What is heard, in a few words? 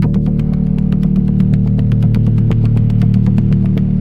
tabla, 120 bpm; Zero-G; Pure Tabla